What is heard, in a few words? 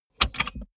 car bmw e46 interior safety belt clasp release hard